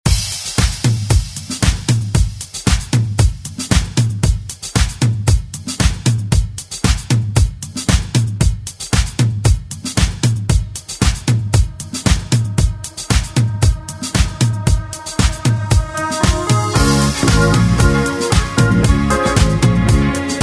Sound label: 70s ReDrum; DJ Mhark